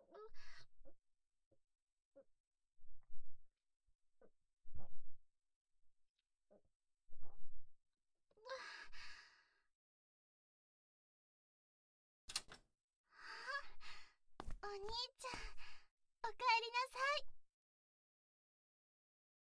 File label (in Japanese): ゲップ, ASMR